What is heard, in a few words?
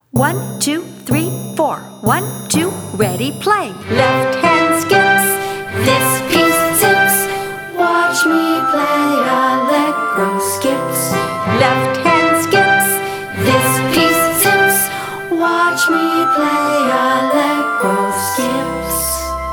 allegro; skips